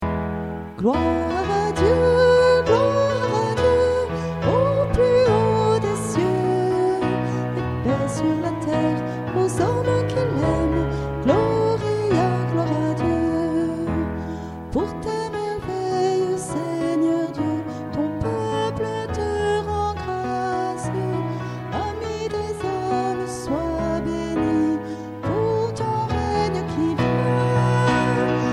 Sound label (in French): gloire a dieu messe d emmaus; Gloria - Messe d'Emmaus